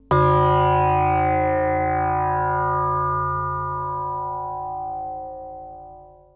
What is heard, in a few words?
Synth hits and riffs